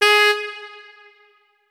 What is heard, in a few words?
notes, saxophone; Hideout Furniture